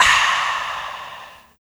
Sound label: AHH; East West